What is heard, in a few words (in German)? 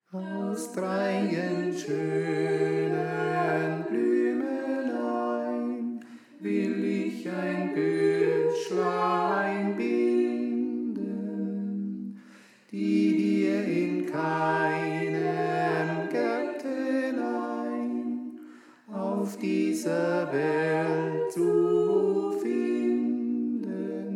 Tenor; Aus Dreien Schönen Blümelein